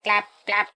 clap clap

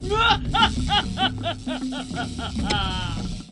mwahaha

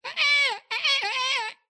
nightingale

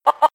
cluck; Animal Sounds; Various Animals; Short calls

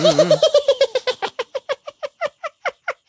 giggle

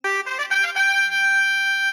fanfare

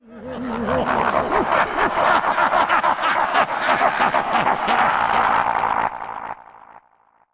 cackle; baal

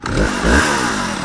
chainsaw

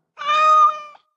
meow; Dragon Age: Origins; Ser Pounce-a-lot; cat

